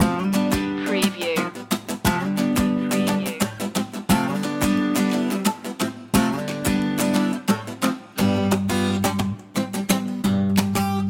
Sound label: creole loop